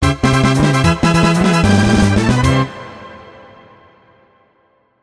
Battle Win; bomberman remake; bomberman 94 remake

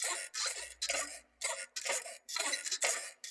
Swing Loop; doodle jump 2; android

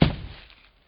Animatronic step

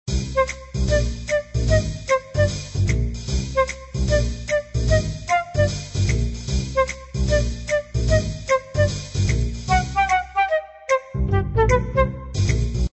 BGM Night; Shop Empire

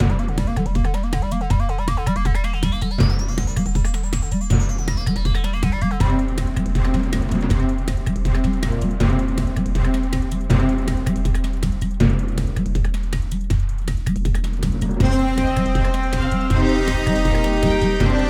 Medieval Combat

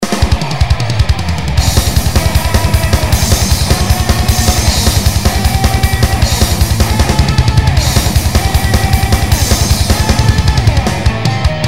Dramatic Power Up

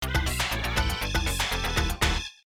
Game Fail; Mach Breakers Numan Athletics 2; Namco NB-2 arcade gamerip 1995

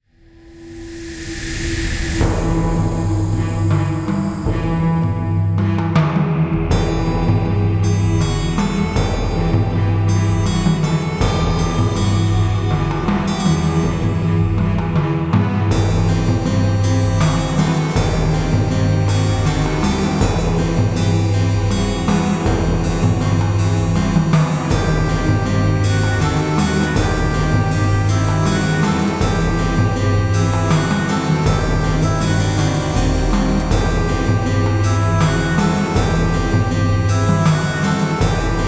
Beacon Dance; Book of Hours

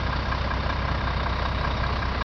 TRUCK Idle loop mono; adams venture origins

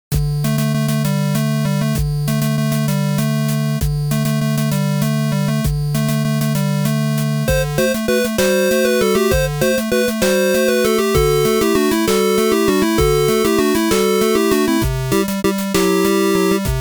8bit ver.; Classic Dungeon

